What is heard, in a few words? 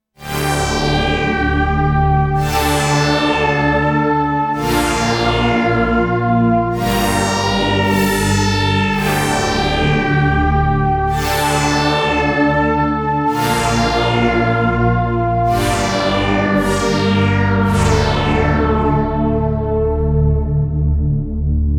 Poly Butter, korg dense